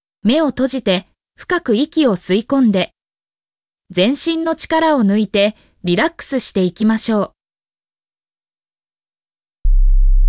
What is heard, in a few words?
33Hz panning